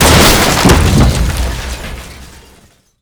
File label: truck through fence; Call of Duty Modern Warfare